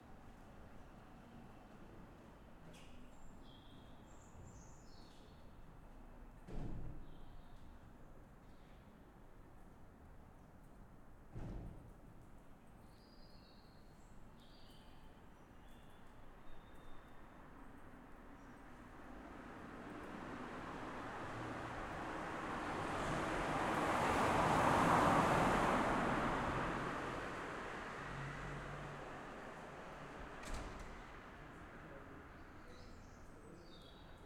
Urban Ambience City Night Under Bridge Cars Tram Bus Closing Doors Birds; Urban Ambiences; Justsoundeffects